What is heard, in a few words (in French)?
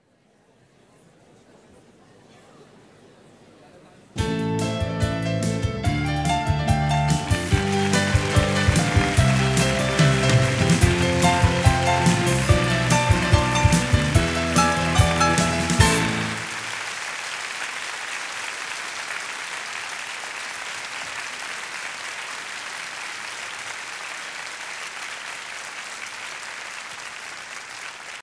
Introduction musicale; Les grands moments de l'Olympia 1983